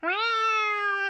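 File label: cat; neko; 猫の鳴き声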